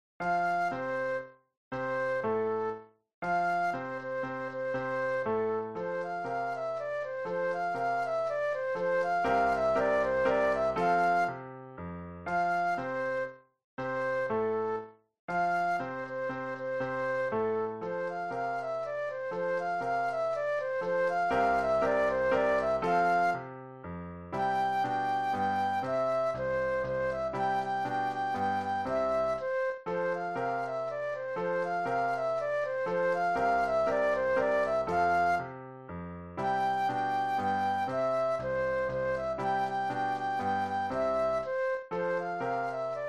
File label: German Dance Flute Solo; classical; Mozart; K. 605 no. 3